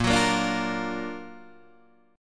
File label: Gb Augmented Major 7th Bb